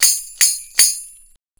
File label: CYMB; USB Soundscan vol.45 - Indian Traditions; AKAI